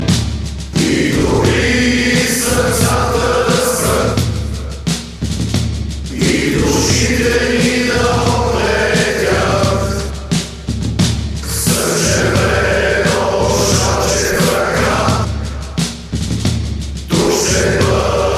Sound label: Ballad